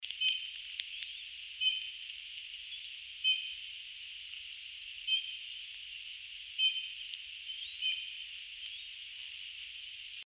Pyrrhula pyrrhula; SETE

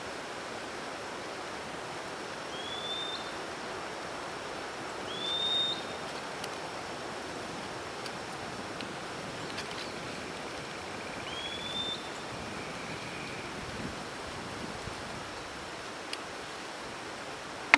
bird

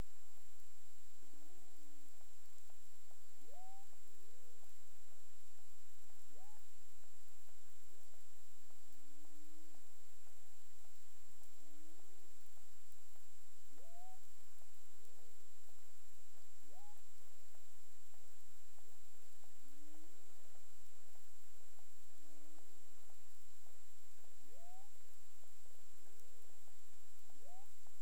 clic; BERMUDE

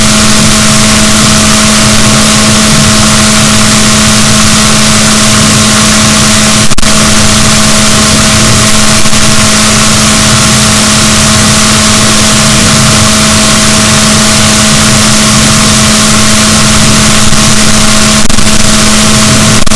rorquals negatif, boat

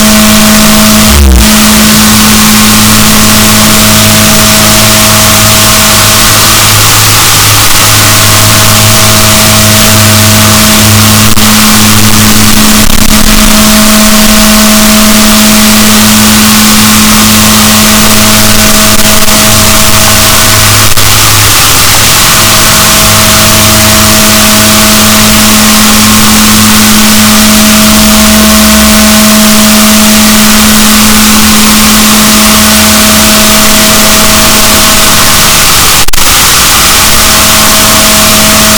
rorquals negatif, boat; Balaenoptera Physalus Chile

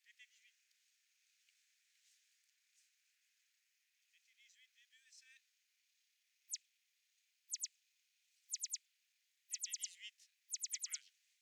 DRONES

